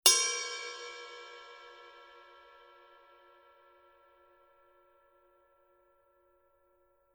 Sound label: 20 blue heavy ride bell, paiste; color sound 900